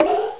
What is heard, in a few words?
Hit-Lose; Professor Fernleaf from the Tropics